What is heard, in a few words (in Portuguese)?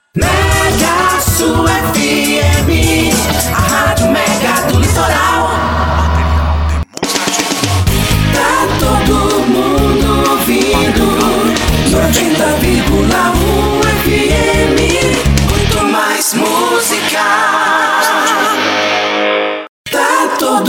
vinhetas cantadas